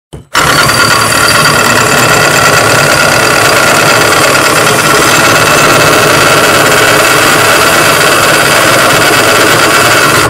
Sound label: Jack Hammer; پیکور برقی